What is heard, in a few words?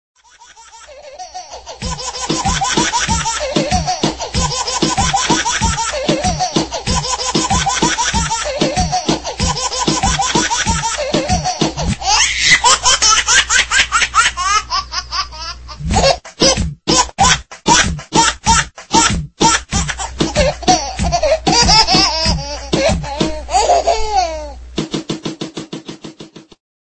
baby laugh rap